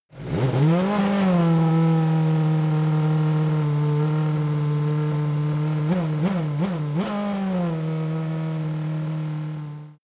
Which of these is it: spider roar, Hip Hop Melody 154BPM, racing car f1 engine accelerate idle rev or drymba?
racing car f1 engine accelerate idle rev